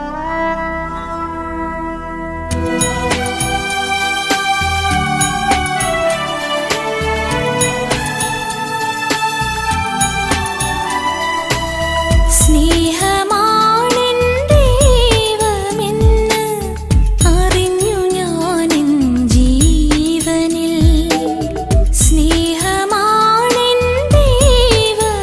malayalam christian devotional song ringtone, christian gospel